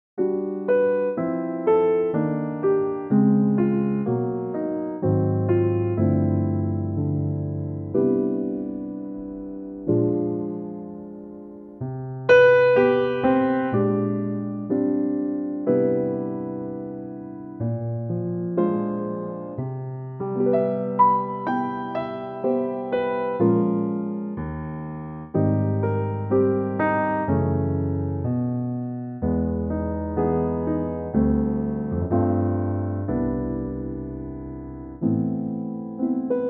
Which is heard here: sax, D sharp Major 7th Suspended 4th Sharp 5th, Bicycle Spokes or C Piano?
C Piano